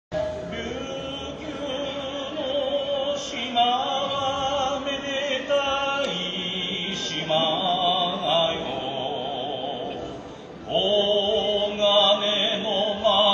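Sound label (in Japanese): 人形囃子, からくり人形演技の際に演奏される; からくり人形(傀儡師)演技の際に演奏される。傀儡師が人形を操る場面。; 人形囃子1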